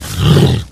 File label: pig pain; dn3d r; pigcop